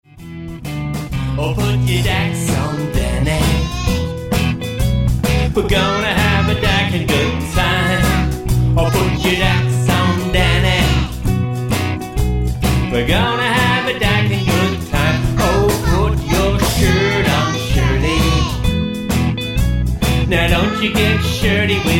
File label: band example